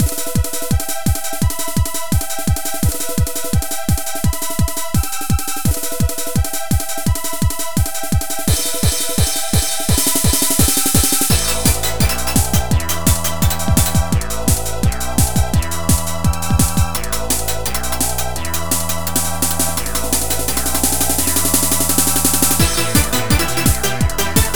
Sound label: Mentor Stage Karaoke; pinky street kira kira music night pop town gamerip